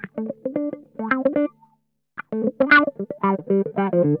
WORMY, Funk Guitar; Zero G - Funk Guitar

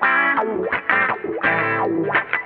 GUITAR

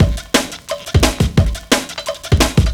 175, Drum Loops; M8; Electronisounds

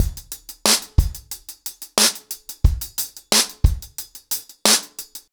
ACOUSTIC DRUMS, 90 BPM

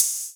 Open Hihats, Cymbals

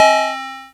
chord

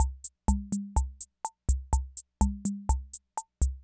Loop, classic machines; arranger

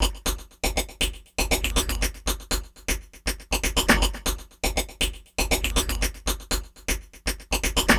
Percussion Loop; Drums & Percussion; Electronic Point Blank

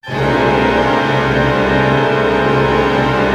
12-Tone, Chord Cluster; Roland L-CD702; ORC

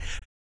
Vocal, Blues & Soul; ILIO; Vocal Planet VOL-2

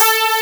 DG WAVE, DIGI SYN

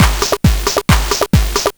Game Boy Kick Loop

